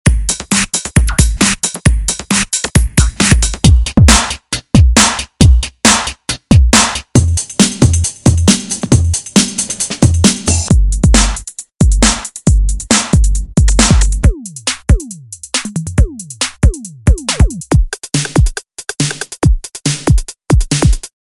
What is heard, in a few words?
Electro loops